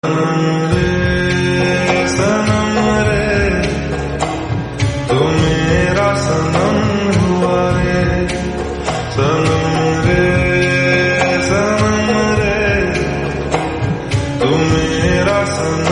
lofi remix hindi song

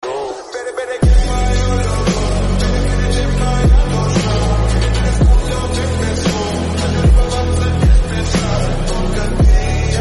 russian song

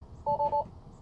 tesla warning chime

